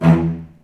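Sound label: E1 arco short cello, left; East West; The Ultimate String Collection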